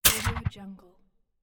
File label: game mechanical impact sound; stormwaveaudio